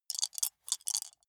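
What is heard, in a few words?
small three rattle in hand closed short, dice; wuerfel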